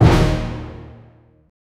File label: orchestral sting hit